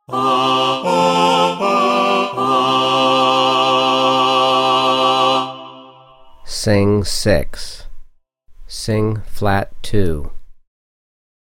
C major Sing 6 and b2 Ascending Alto; contextual ear training voice edition 2

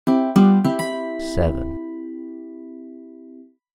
7th Mandolin Major; ear training instrumental color

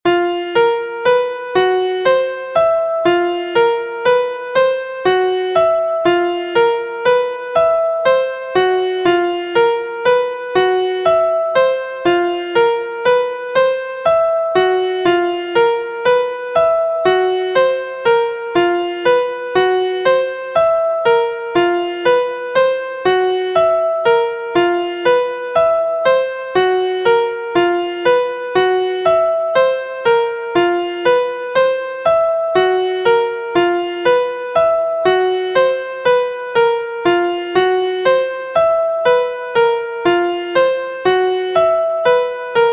Degree 1 b2 2 b5 5 b6 2nd Inversion Key Bb; harmonic and melodic equivalence; trichord pair

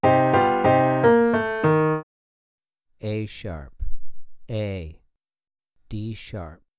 Piano Min a#2 a2 d#2; ear training three note melodic

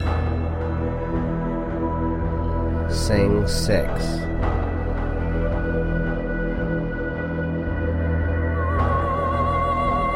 Soprano Key of E; real world music ear training

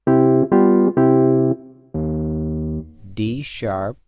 D#1 Wurlitzer Major; ear training instrumental color

